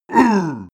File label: male giant hit; menschen; schreie